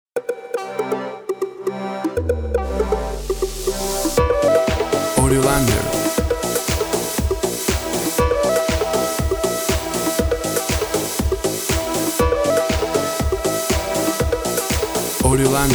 Top 40 Chart EDM

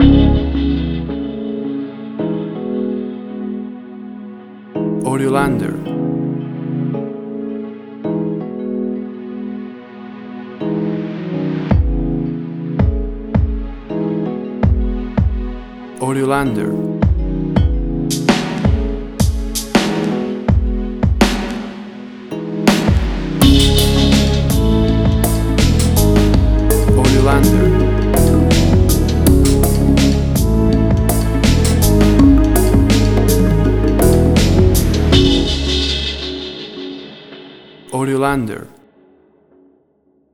Uncertain Orchestral Hip Hop

